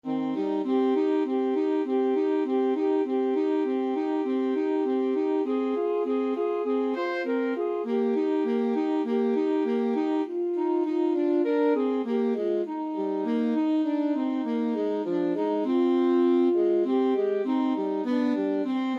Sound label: alto saxophone